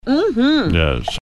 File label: mmhmm